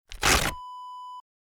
paper rip

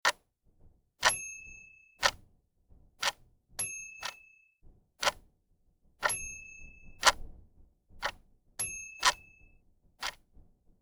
clock

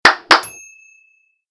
double claps

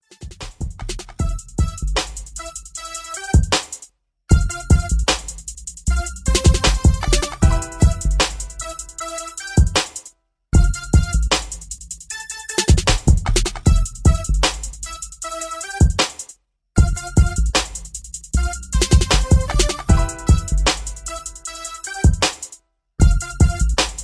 Derty South Chords

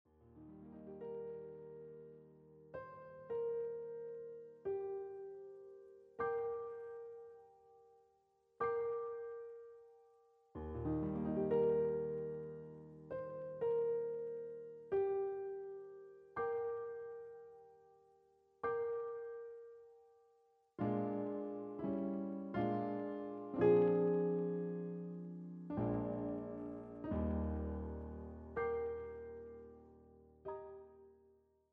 piano roll; A Christmas Eve Fantasy

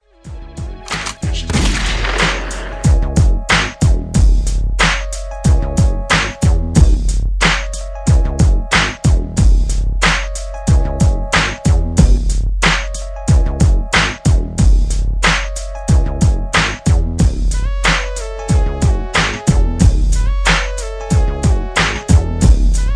instrumental; produced by Knife

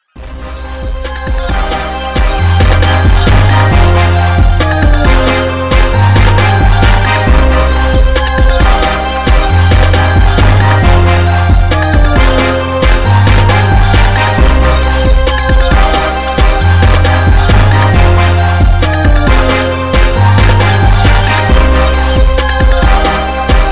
new horns

